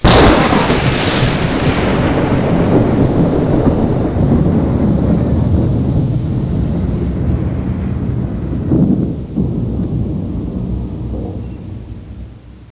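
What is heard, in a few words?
thunder; enpro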